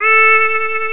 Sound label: DIDI, SYNTHESIZER, DIGI WAVE